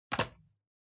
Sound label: Pencil Case Put Down